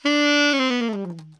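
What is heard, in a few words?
alto long fall D 3, saxophones; Greytsounds - Philip Wolfe David Crozier's Saxophones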